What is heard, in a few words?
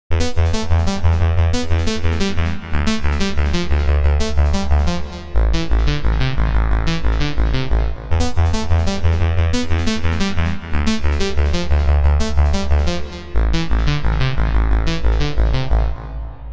selenium arp; the space soundset